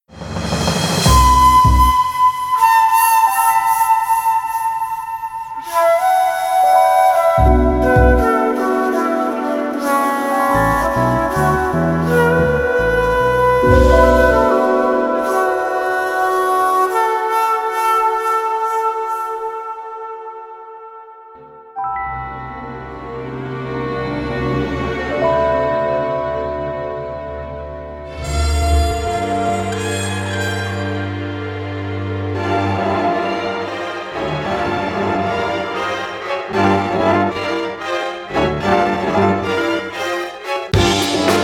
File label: Shocker House; JoosTVD; For Your Pleasure Vol2